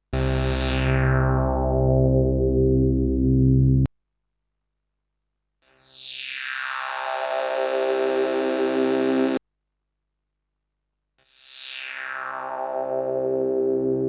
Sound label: LP HP BP BS BB RP LP Voc Form 1 2 3 460 Hz Reso 30 perc